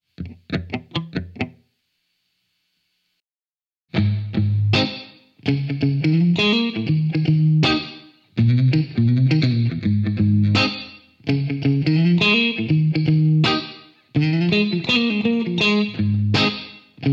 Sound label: Funky Riffage